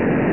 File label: thrust; buzzbar